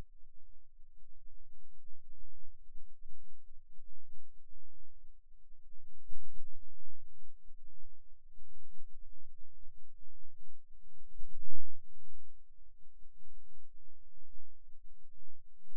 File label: Rhythm Wave, eeg seizures